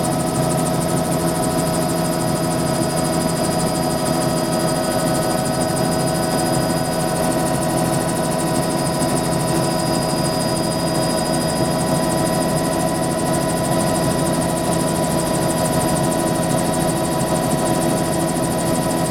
Helicopter Bell Maybe On Board Or Nearby Flying Or Hovering Nice Blades